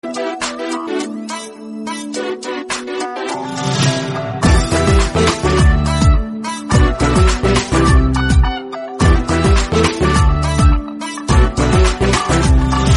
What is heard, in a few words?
nice background dance music tone ringtone